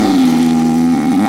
eigen fart

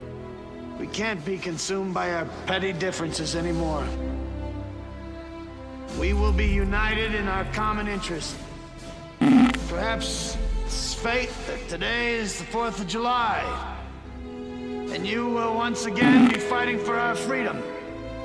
Independence Day with farts

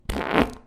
Fart Short Ripper

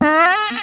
farts

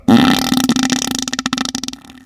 example fart

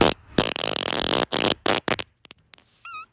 farts

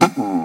farts

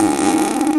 eigen fart

